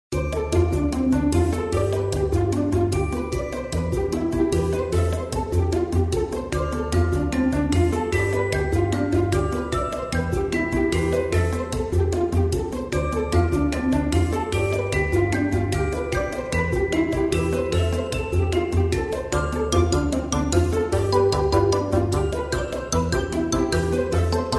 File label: Vintage Party Ringtone